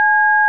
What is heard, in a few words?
dtmf c